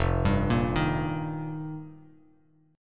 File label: F 9th Suspended 4th, Bass Standard; arpeggiated; JGuitar